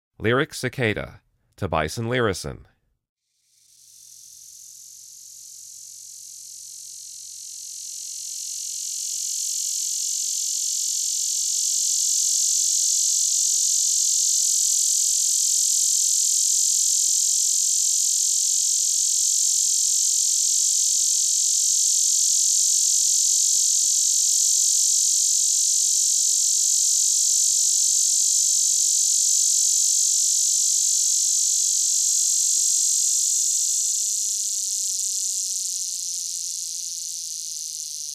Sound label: Lyric Cicada, Insects; The Songs of Insects